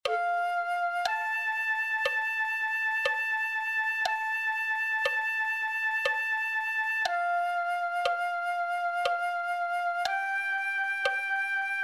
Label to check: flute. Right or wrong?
Right